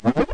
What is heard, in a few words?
stereo videogame rub; Weird and Interesting Hits; single hits